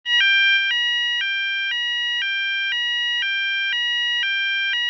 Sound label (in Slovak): tón č. 11, opticko-akustická signalizácia; LED opticko-akustická signalizácia A-MLOAS 24V AC/DC